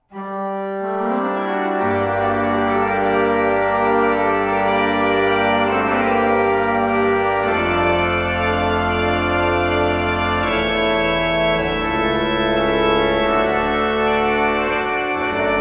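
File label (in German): Van Vulpen 12 II P, pfeifenorgeln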